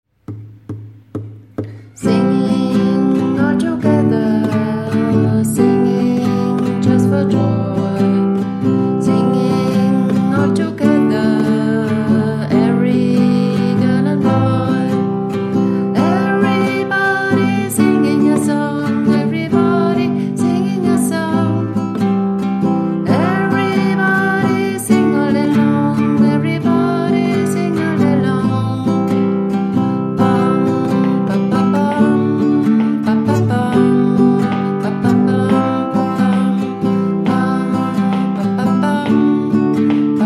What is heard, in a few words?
Singing